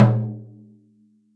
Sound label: TROM RAND F, sticks; SoundBytes - Early Patches